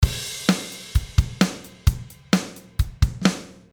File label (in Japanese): drumフィル